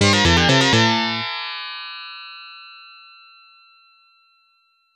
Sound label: Miss; Iron Horse; Dai Ressha Goutou; arcade